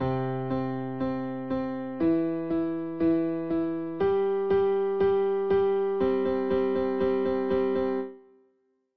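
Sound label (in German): Rhythmik Übung, klavier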